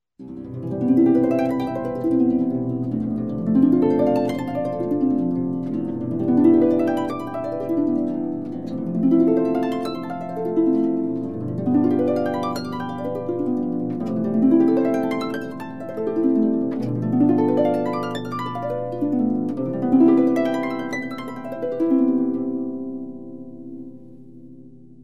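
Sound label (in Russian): игра на арфе в арпеджио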